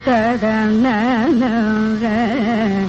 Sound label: Kharaharapriya 2 Shruti G1